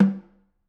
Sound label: Snr Off, drum